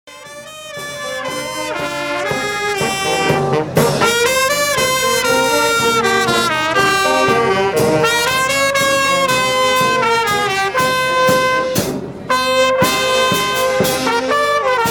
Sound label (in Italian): Marcia Bandistica, BANDA